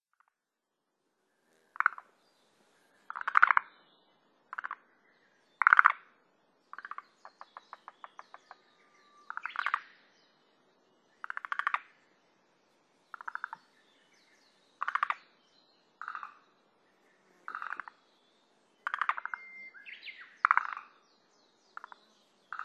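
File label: Forest Green Tree Frog; moriaogaeru; sado; koe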